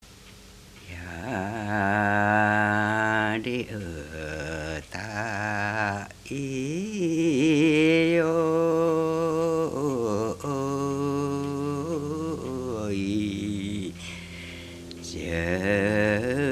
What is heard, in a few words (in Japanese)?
taueuta, minyou